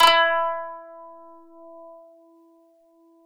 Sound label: KANUN E4; SoundBytes; Early Patches; KANUN SMAL